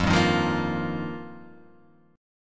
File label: F 7th Suspended 2nd Suspended 4th F